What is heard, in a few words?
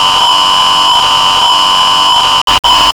dead static; cstrike; D26 slender